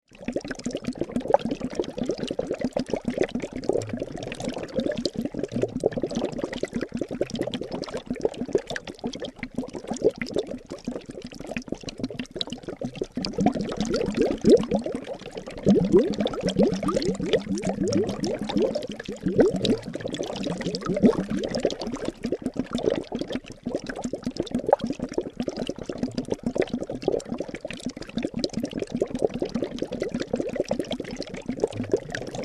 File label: Horror Cauldron Boil Water Potion Bubbles Small; Scary Soundscape Sound Effects; SFX Bible 6